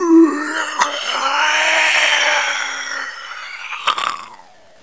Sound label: spawn; cstrike